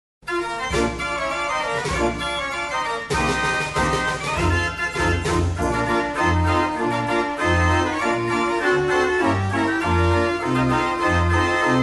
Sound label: foxtrot medley